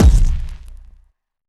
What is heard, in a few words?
Drums; Distored Reality